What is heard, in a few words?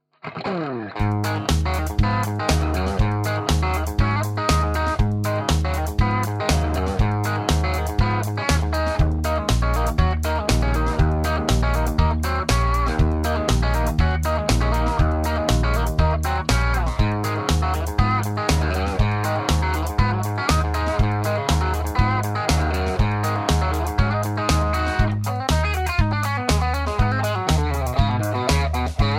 crunch bridge; schwarzbach; VP-Tele-Pre55